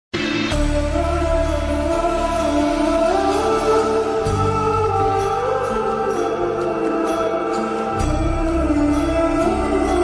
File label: shree ram bhajan ringtone hindi ringtone dharmik ringtone